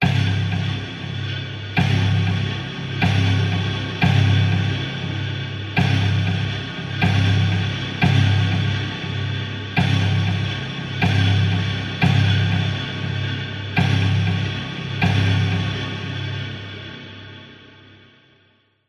sound design cinematic dark tension rhythm stabs synth 120 loop; zapsplat